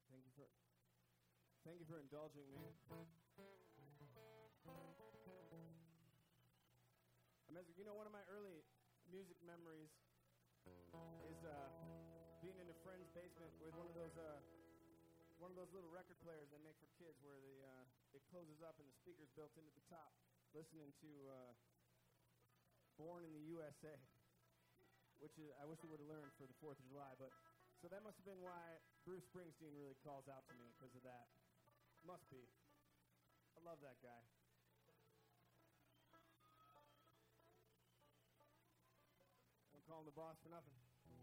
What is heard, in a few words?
Bluegrass; Big Meadow Stage High Sierra Music Festival 2012